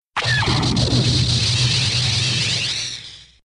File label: Tie Fighter Firing Sound Effect